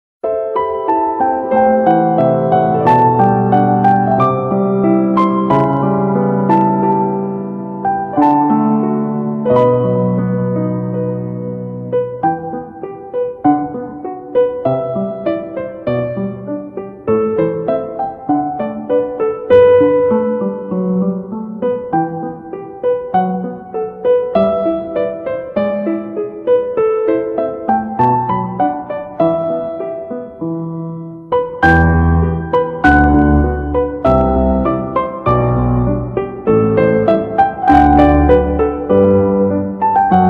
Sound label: Piano